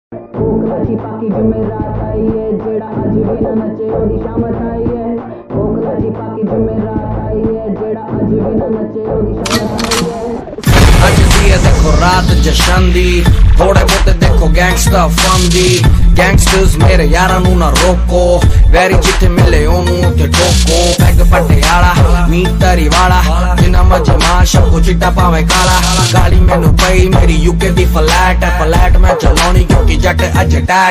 rap hindi ringtone

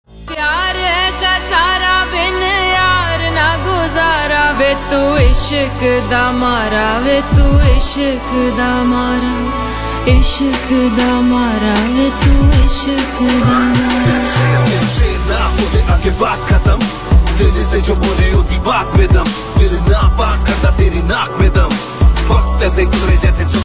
pakistani ringtone

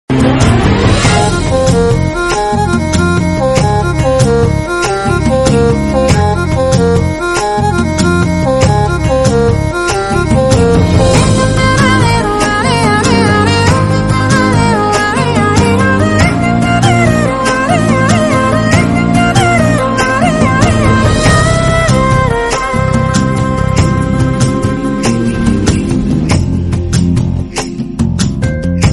BGM tamil ringtone

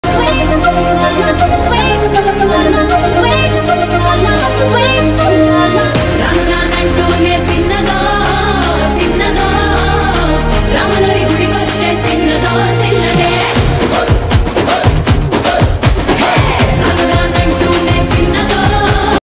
telugu ringtone

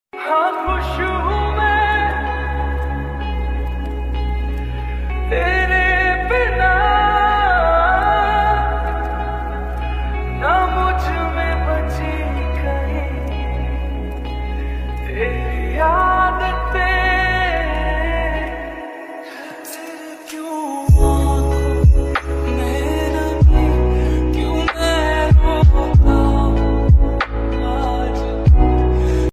lofi remix hindi ringtone

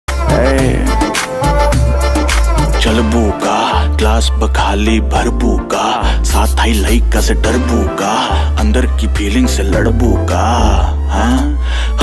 bhojpuri rap ringtone

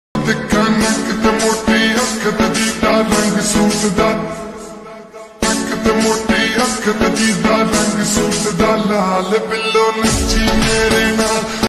lofi punjabi ringtone

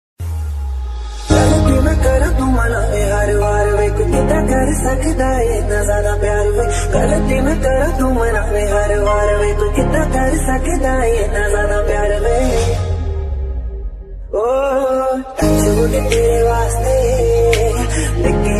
panjabi ringtone

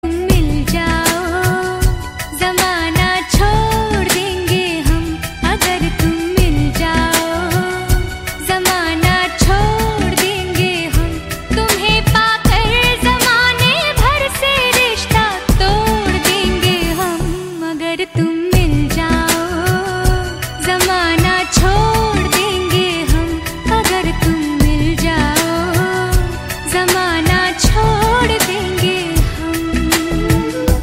Ringtone

